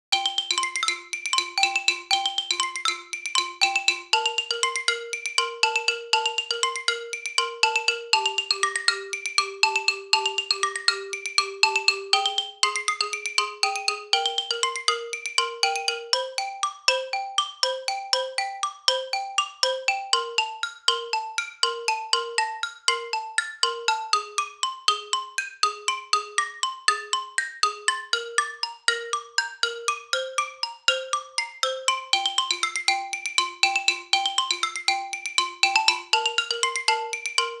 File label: Woodpecker percussion